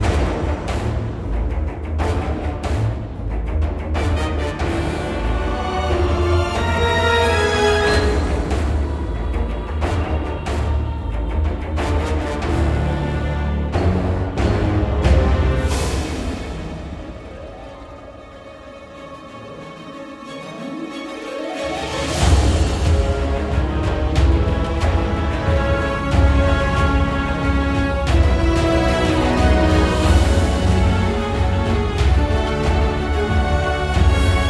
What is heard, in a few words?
music boss loop; yoggrathaal